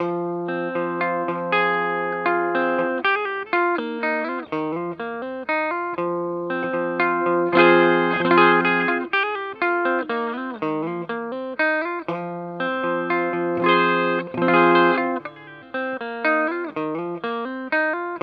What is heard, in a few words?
Ibanez Artcore